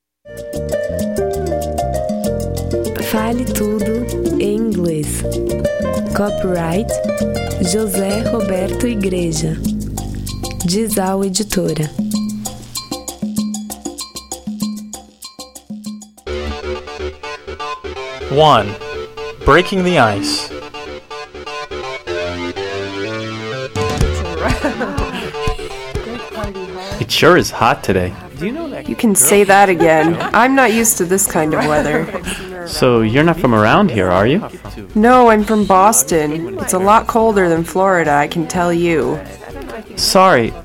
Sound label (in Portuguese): Trilha